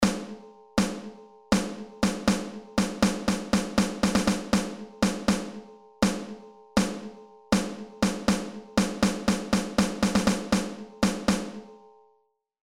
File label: Rhythms Snare Drum